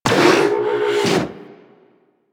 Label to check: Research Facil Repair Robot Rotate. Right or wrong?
Right